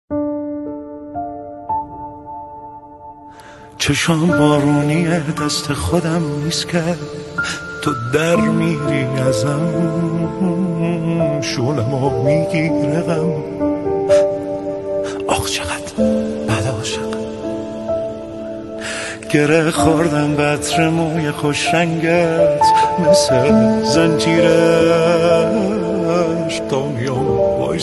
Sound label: Piano Version